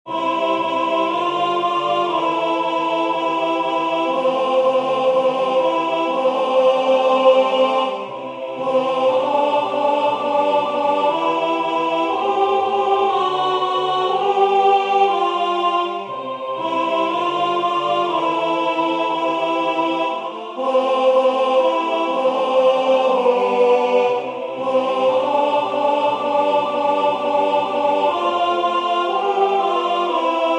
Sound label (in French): Alte; Hymne au soleil; Concert du 13 décembre 2024 en l'Église St Pierre - St Paul